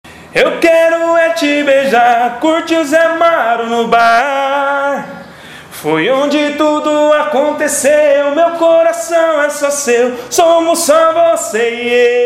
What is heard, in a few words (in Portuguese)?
Vinheta Candada SECA